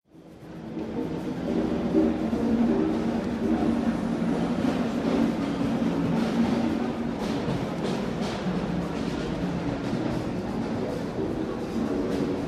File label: noise musikmesse percussion